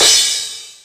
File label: Drum Machines, Korg Triton, Under Hard Kit